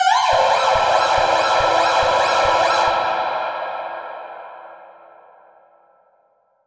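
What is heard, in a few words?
alarm siren, echo processed, 170bpm; musicradar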